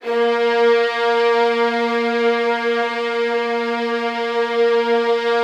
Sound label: Violins, A#2, Classic Orchestra; Best Service ProSamples vol.51